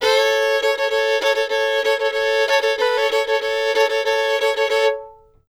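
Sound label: fiddle loop 80; Sampleheads; Whole Lotta Country 1 & 2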